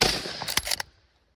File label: lee enfield firing sounds; trenches; godot